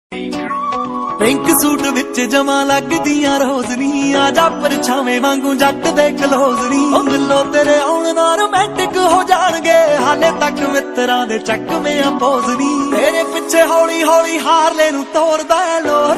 punjabi ringtone sad hindi ringtone heart touching bgm ringtone